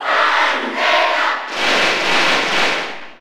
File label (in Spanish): Cheer Spanish; Palutena; SSB4